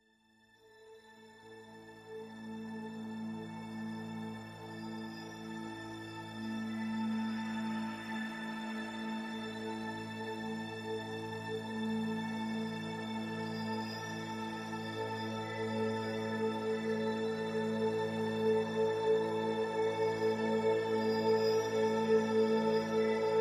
Pads and Strings

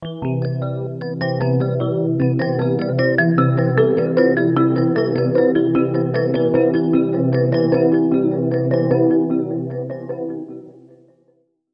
Arp Sting

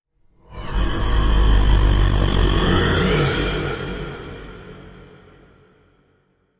Minotaur Flyby Roar

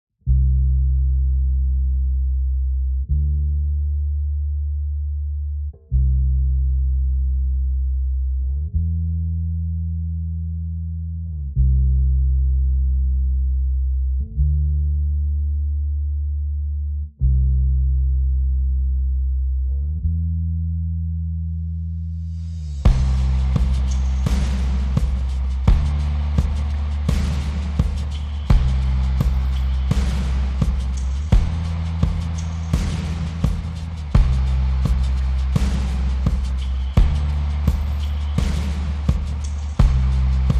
Drums and Bass

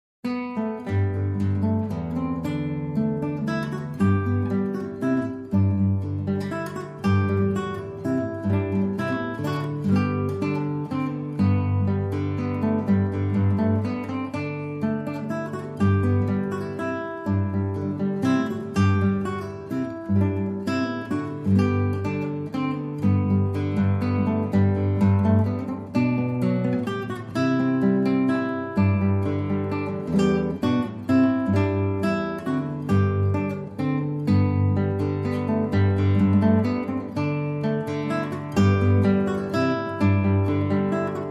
Solo Guitar

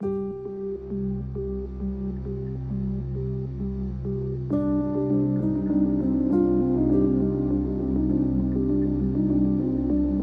Blindfold, No Perc

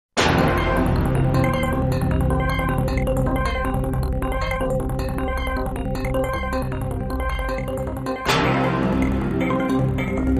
High Energy Tabloid News

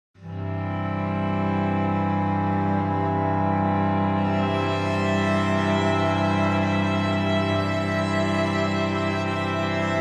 No Wind SFX

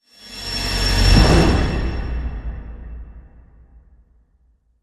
Hit Hor Stare

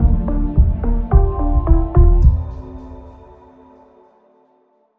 Bumper

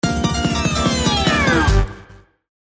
Latino Bounce

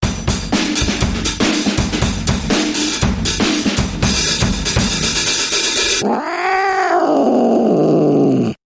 Cat Walk Dance Bumper Reversed

